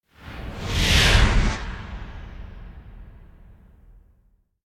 Whoosh Harsh Coming Air; Hollywood Edge; sound designer's toolkit v2

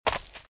Footsteps - Leaves - Run - Right; RadwinIsland

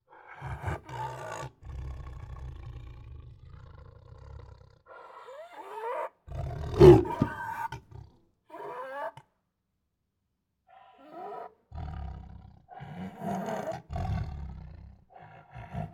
Abrupt Throaty Breaths, Tigers; Sound Ideas

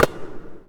Basketball Rebound

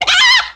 hit chickens bukuk; Blind Drive

